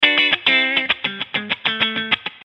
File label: Funk Guitars